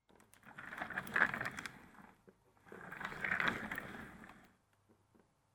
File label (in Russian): zvuk kresla